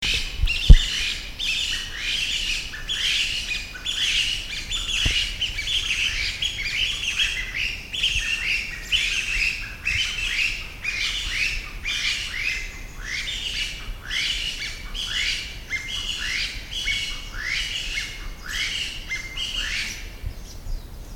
Mountain Bamboo Partridge Bambusicola fytchii Bambusicola sonorivox, mountain bamboo partridge call